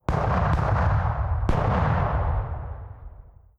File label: distance artillery unit fire; Hearts of Iron IV Death or Dishonor